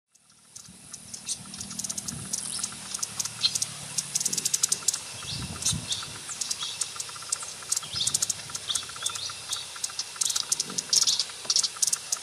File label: Black crested coquette